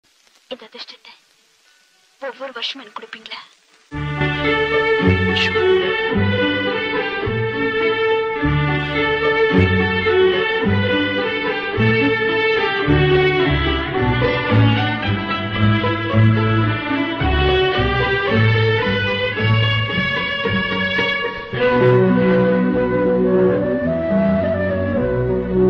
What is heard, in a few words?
tamil bgm; Johnny movie